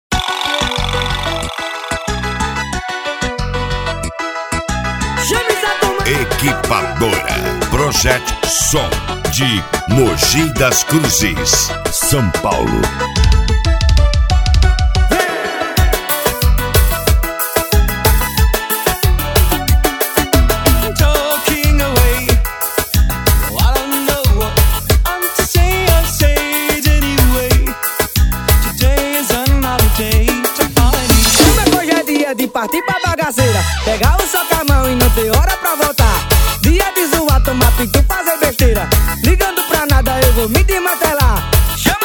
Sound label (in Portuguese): piseiro; equipadora proget som 2024